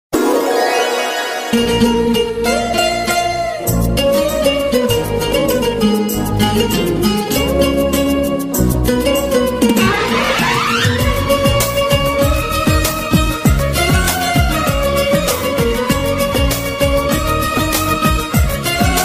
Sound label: love bgm; Nuvvu Naku Nachav